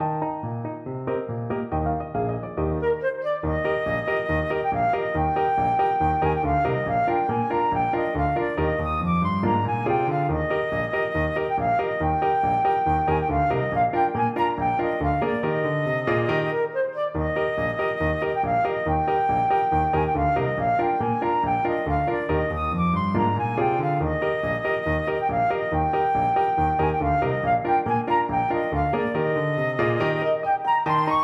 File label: bulgar, flute